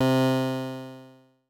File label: synth poly c2